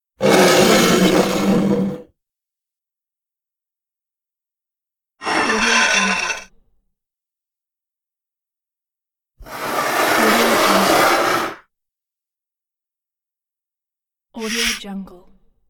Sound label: moving figurine on surface